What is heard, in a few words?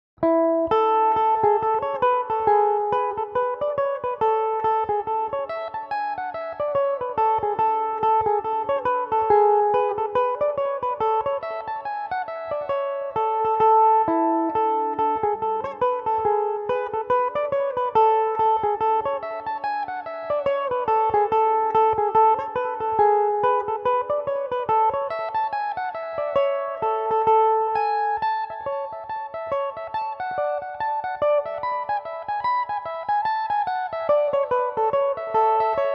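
A major belmuse, irish mandolin; The Handsome Ploughboy; Belmuse EM-190 electric mandolin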